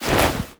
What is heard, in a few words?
body oneshot, foley